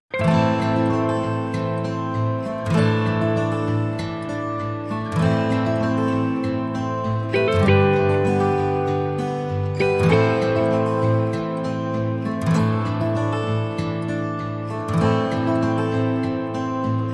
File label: Guitars Only